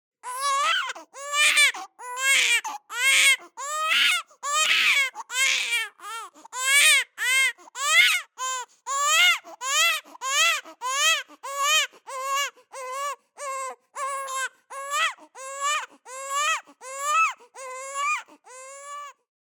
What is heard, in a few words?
BABIES SCREAM Boy 3 months Decrease; Baby Boom